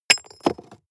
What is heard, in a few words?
drop stones impact